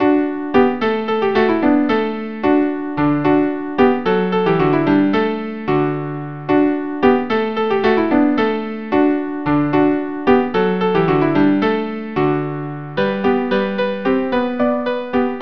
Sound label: branle double cello